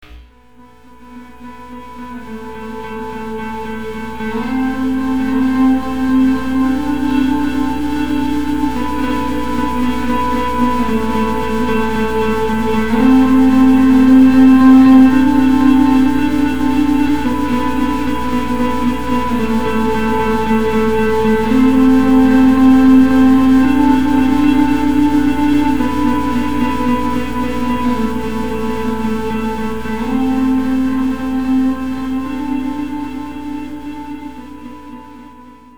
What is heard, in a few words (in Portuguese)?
vinheta alienigena